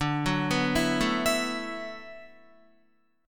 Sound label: D 6th Add 9th D, Guitar; D6add9 arpeggiated; JGuitar